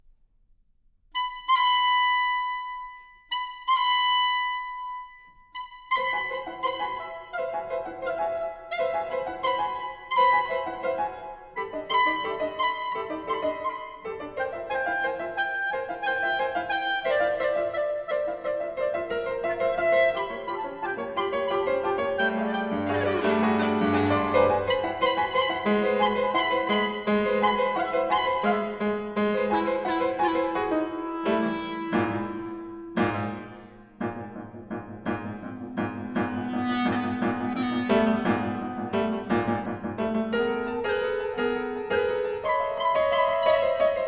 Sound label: Clarinet and Pianoforte